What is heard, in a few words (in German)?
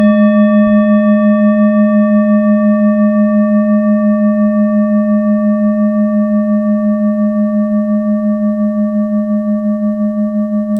Klangschale Tibet Gis; Klangproben